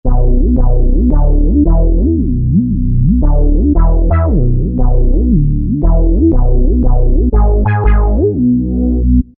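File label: Poly Syn Bass, Roland V-Synth; Factory Patches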